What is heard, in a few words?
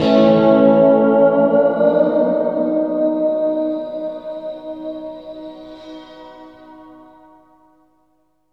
GTR WET; AMG - Now CD-ROM (Roland); Guitar CD